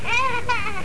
Baby noise; Olduvai Sounds

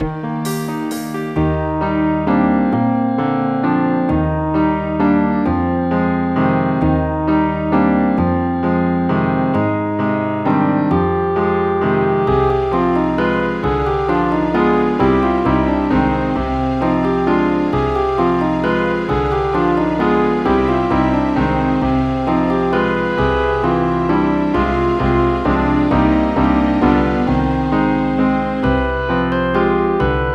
waltz; Composers' Showcase; Waltz #3

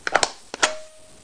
Switch Box

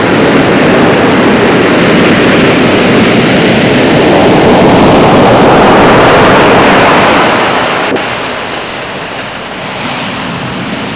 shore; Atmosphere Lite

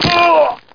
land hurt; Daikatana; superfly

